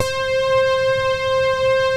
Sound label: POLY PAD SYN; Z1 PADS; Pro-Rec - Synthsonic